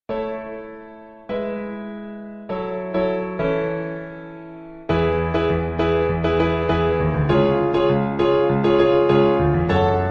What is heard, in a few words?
accompaniment; mamma mia vocal audition materials; KEYANO THEATRE COMPANY